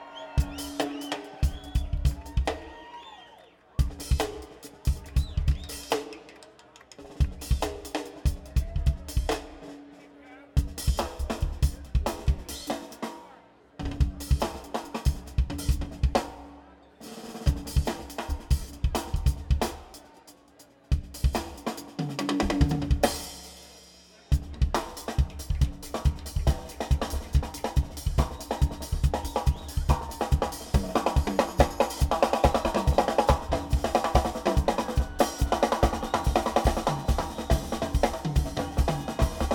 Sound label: Drums; matrix